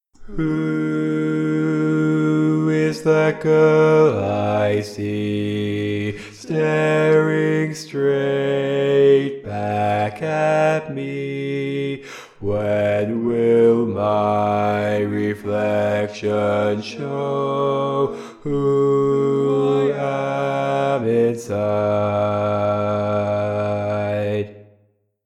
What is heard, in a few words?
Bass, barbershop tags